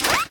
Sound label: jump